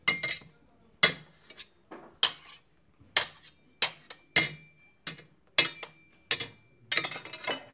Small Sword Fighting

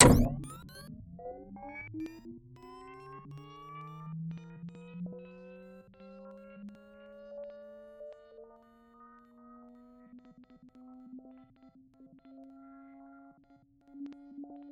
Flash Sound Filter pan

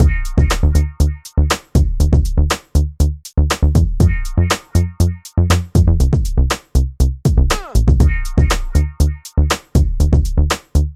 Hip Hop Beat

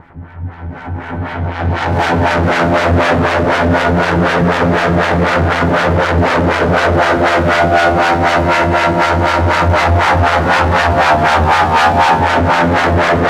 Analog weapon loop

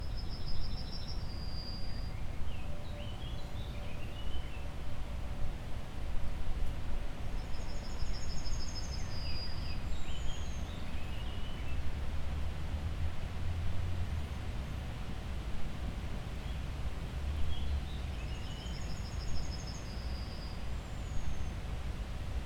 Background Noise Leafs City Birds

